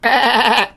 Sheep

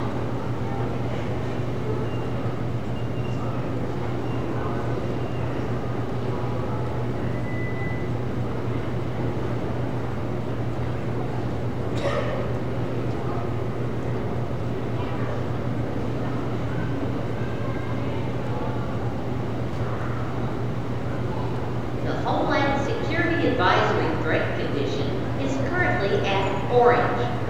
Airport Security

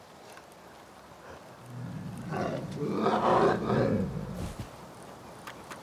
Bear Angry Growl

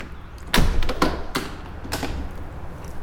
Switch

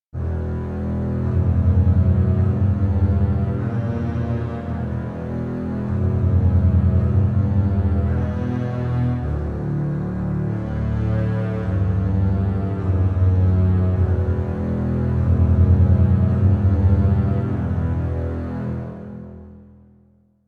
Bass And Cello

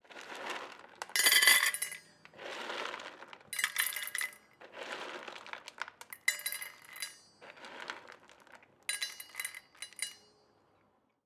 Cat Food In A Bowl

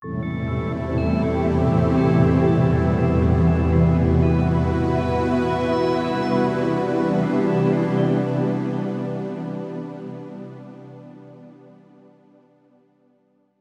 A Min Strings Arp

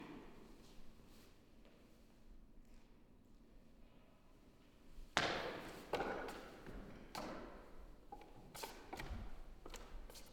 Parkour Sounds In Concrete Circular Room

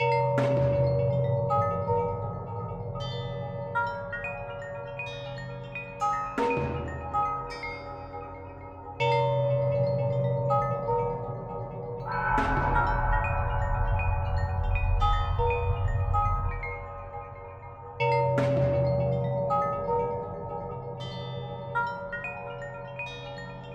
Bell loop Long

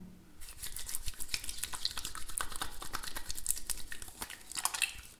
Hand Wash

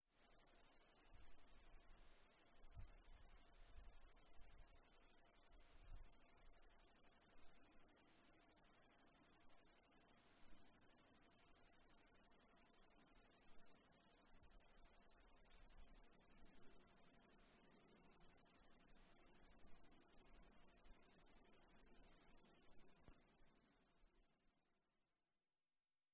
Night Crickets Cedars Forest; Criquet La Nuit

